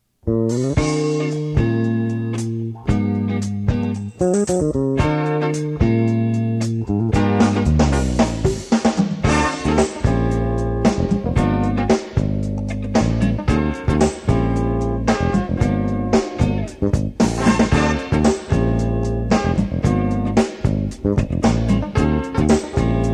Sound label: grovin pop